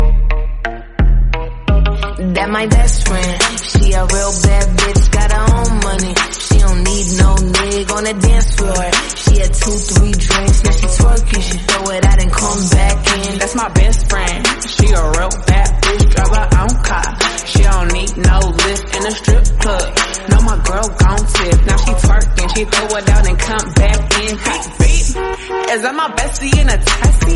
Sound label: English Song